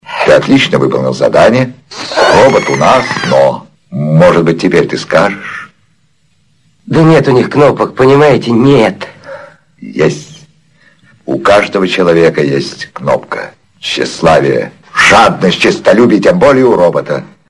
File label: electronik